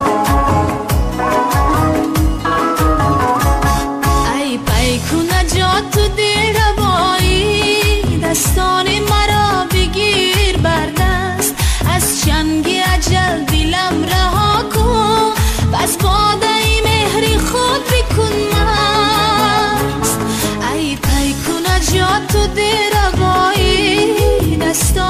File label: sahantajik song